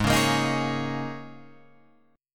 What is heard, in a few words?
Ab Suspended 2nd Suspended 4th Ab down Guitar Open F; strummed; JGuitar